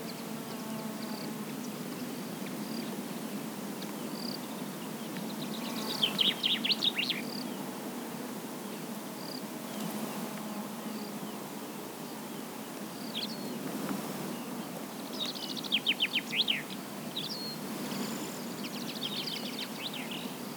Âm thanh môi trường nông thôn làng quê

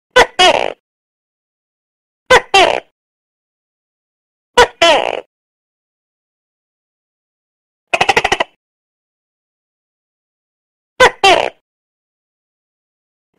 Tieng con tac ke keu cho be ngu

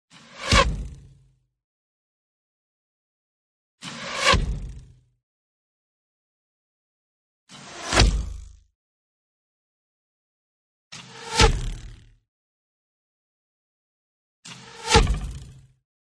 Tieng mui ten bay

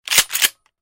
Tiếng lên cò súng

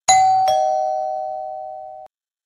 Nhac chuong chuong cua

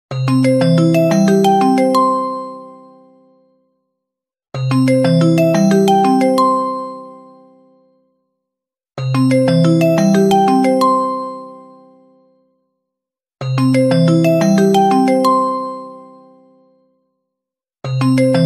Nhạc chuông cuộc gọi wechat; เสียงเรียกเข้า Wechat; Wechat default ดั้งเดิม; original Wechat; call ringtones, default

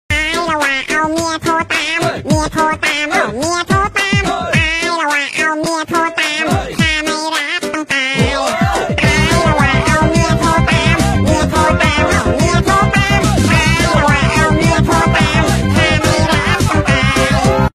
nhac chuong hai huoc; ริงโทนตลก; เสียงเรียกเข้าตลก

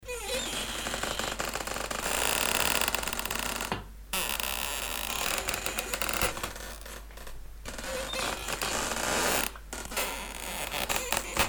ghe cot ket

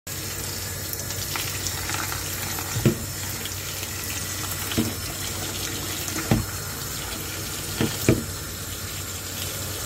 tieng rua trai cay duoi voi nuoc

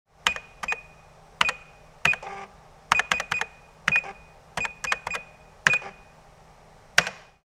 Âm thanh tính tiền

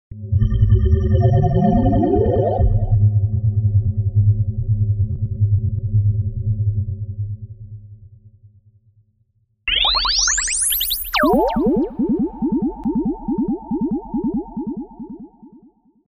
Am thanh xuyen qua duong ham khong gian va thoi gian

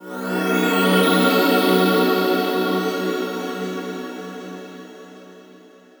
Am thanh giac mo xuat hien

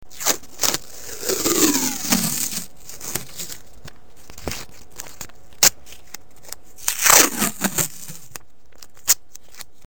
Tiếng lột kéo băng dính dạ dán

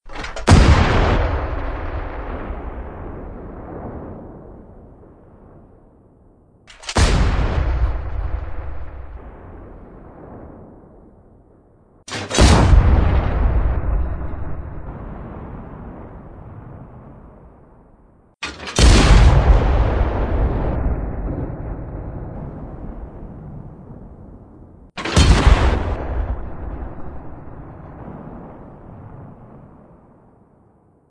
Tieng sung dai bac

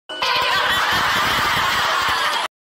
tiếng cười hài kịch

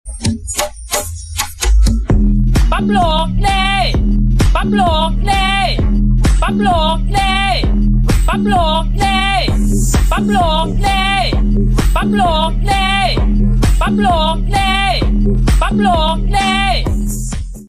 Nhạc nền bập lược dễ tiktok

